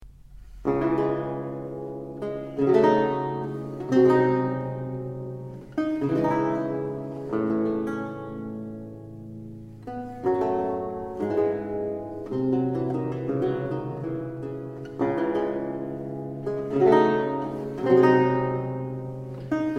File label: Violin Partita 2 In D Minor; Bach